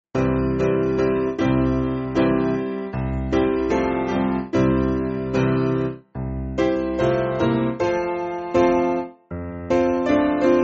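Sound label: Piano, Music Backing Files for Churches; I Have Decided; Assam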